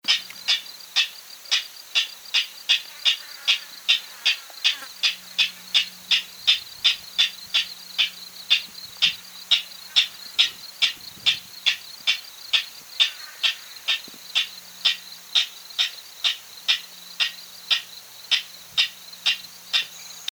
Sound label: Ridgways Rail Rallus obsoletus yumanensis